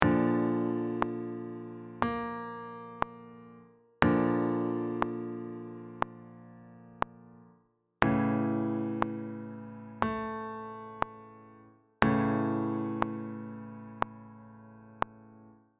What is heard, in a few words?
Warm Up With Me Lip buzz track only, trumpet